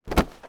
cloth drop

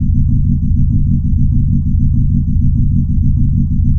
ship engine low hum

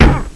SLAM YEOW

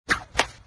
whip crack

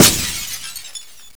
glass shutters

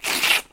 paper rip

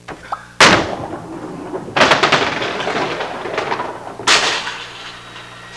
GUTTER

